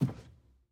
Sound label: Footstep Lab